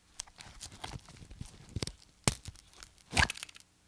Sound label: holster; blindside